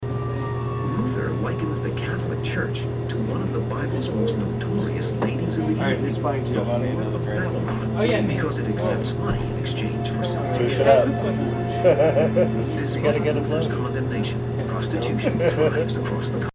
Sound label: church